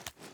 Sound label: ar reload empty fast grab mag; weapons; gmod; viper